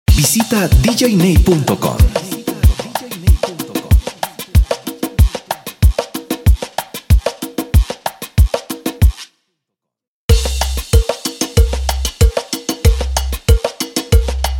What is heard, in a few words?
Agresivo InSalsa Acapella Hype